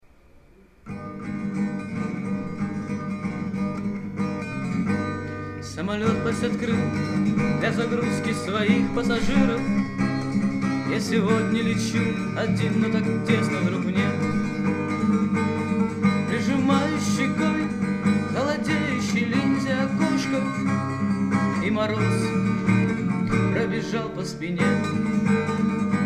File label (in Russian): gitara